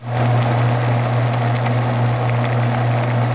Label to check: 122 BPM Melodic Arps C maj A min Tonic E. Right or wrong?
Wrong